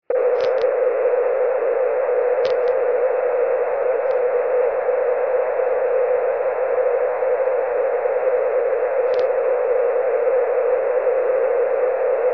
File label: plasma tv east 160m; Plasma TV on 160m Band; N1BUG